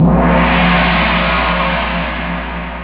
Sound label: gong; jazz jackrabbit 2; M8